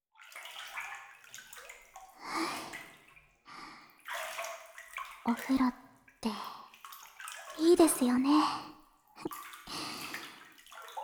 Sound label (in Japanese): まったりお風呂 右, ASMR